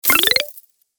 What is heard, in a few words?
Frame change sound